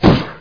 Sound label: net fire; tnpdemo